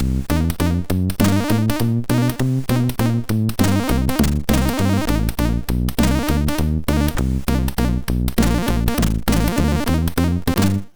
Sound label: jazz, MOS6581R4